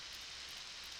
Background Noise

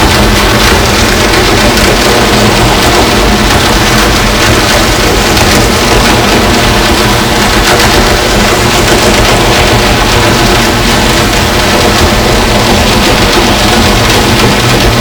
Hydrophones, orcasound

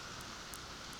Background Noise

